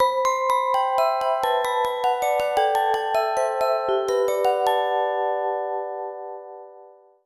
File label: JGL SLEEP; Girls RPG Cinderellife; 3DS